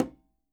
Quinto, Percussion; Sampleheads; NYC Percussionworks

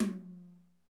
TOM TOM

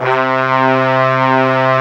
BRASS, Brass Bones, Brs Layer; Club-50 - Foundations Roland

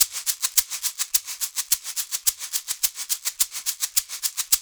LOOP, SHAKERS, Percussion

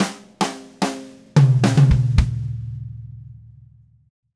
Red Maple Groove 110, DW Red Maple Kit

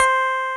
slapor C5

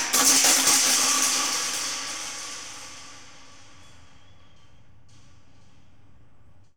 THRASHY ME, Thrash Metal; SFX

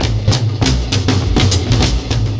PERCS, 70 s Breakbeats

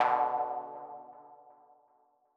M Mono DBeat B2 V127; KG MegaSaur

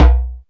DJMB, Korg Electribe EMX-1

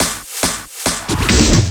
Fills, Loops 140 BPM

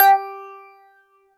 THROATY, PHASE; Synth

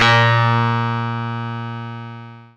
PLUCKED, Synclav FM, A#; Big Fish Audio

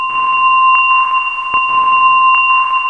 ringing C2

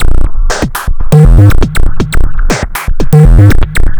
Robot Thunder, Modular Electronica

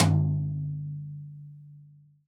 TD-20 Tom B, Roland TD-20 Toms

